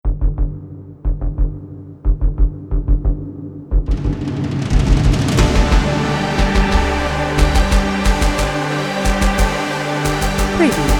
Sci-Fi Synth Cyberpunk Atmosphere Army Military Big Marching